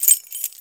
Pickup Key; Pickups

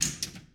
Pinball Flipper

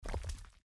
Grey Stone; Blind Quest - The Frost Demon

